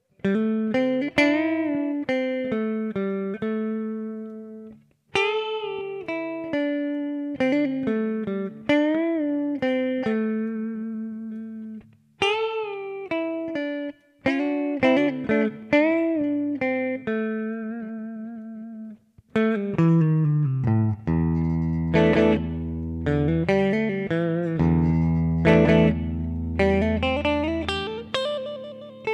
Embellishment Study, guitar

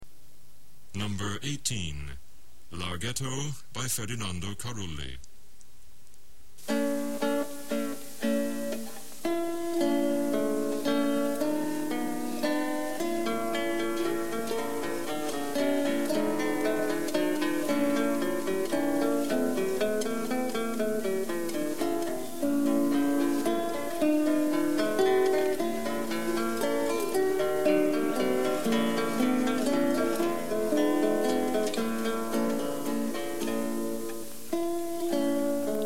larghetto, guitar

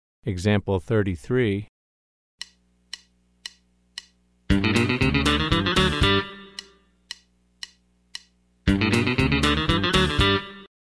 Country Rock

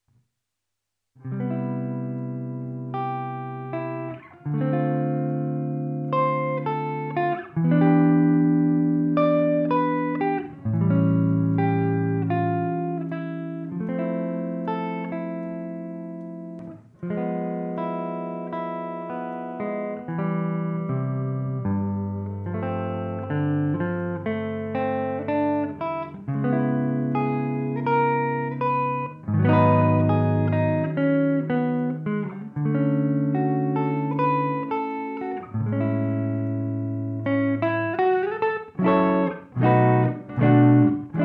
Chord Study In 7ths

